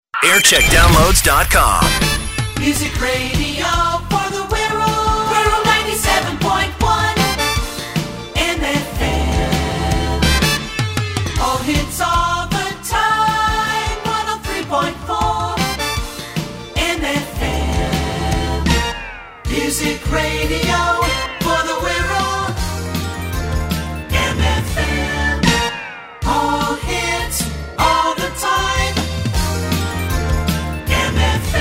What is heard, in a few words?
jingle montage